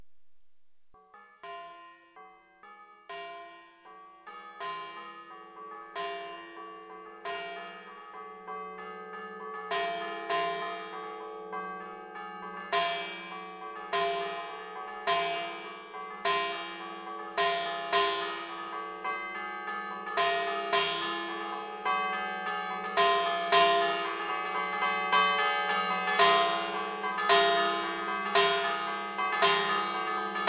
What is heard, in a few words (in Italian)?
Santuario, Campane Liguria; Montegrazie; Imperia; Archivio Balma Campane Liguria; Polifonia